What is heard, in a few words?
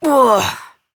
Struggle Short, Adult; Warframe; Duviri; Drifter; Efforts